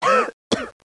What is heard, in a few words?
Cough